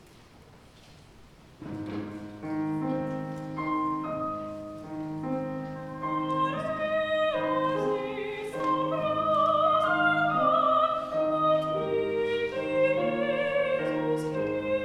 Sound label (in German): Maria Wiegenlied